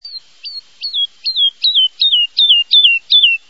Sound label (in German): heidelerche; singvoegel